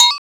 hi-percussion, techno; Techno ID; single-drums

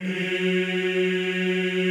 EEH F#2, Extended Classical Choir; Best Service

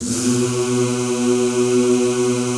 ZZZ B1, Classical Choir; Best Service; Extended Classical Choir

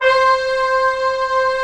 ORCH C0 PP, Orchestra; Sound On Sound; Peter Siedleczek's Orchestra

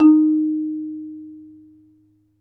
MARIMBA B; Synclavier Percussion; World & Orchestral; ILIO

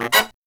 HI CHORD, Brass

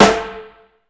snare; Hollywood Edge; The Big Bad Beatmachine; PROCUSSION

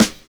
SD, Drums Dance & Groove; USB Soundscan vol.11; AKAI

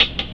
DIRTY HAT; East West; Phat & Phunky

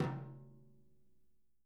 Living Drums, tom; JZ XTOM 1; Sampleheads; Peter Erskine

